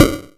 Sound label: SD-Burst, Korg Radias